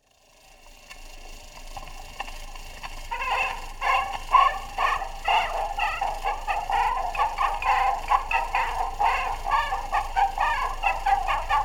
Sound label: polka, kinder grammophon